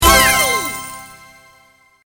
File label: UI Scatter; Brazil; sloteliminate